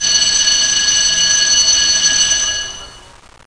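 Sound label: school alarm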